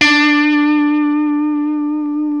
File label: Les Paul, guitar